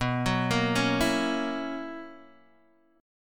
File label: B Major 7th Suspended 2nd Suspended 4th B Guitar Open D